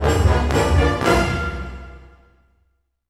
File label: Orchestral Loops; 128 STRAVNSKY